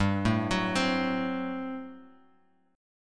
G Major 7th Suspended 2nd Suspended 4th, Guitar, Open G; 5,2,4,5,x,x; down